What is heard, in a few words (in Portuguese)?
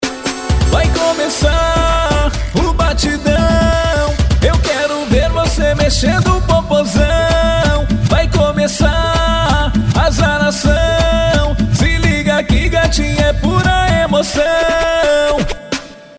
batidao